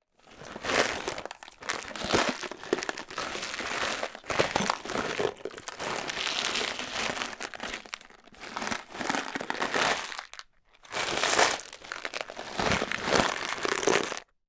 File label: industrial screws nails in plastic box lid on movements